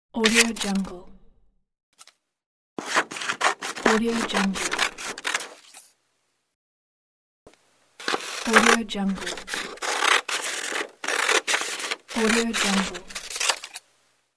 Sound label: scissors cut paper